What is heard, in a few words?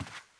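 dirt slow walk, footsteps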